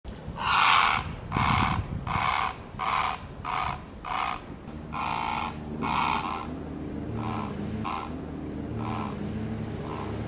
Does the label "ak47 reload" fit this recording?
No